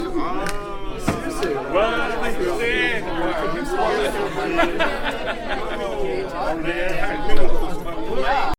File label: crowd talk